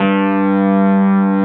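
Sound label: Wurlitzer G1 - R; USB Soundscan vol.09; AKAI; Keyboards Old School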